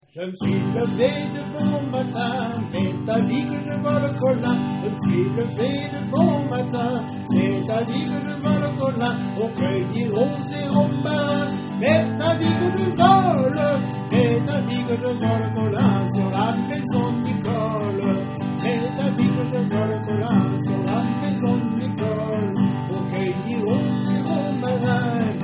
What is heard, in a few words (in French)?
Chant; Je m'suis leve de bon matin